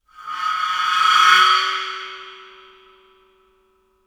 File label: splash bow, Suspended Cymbals bowed; Percussion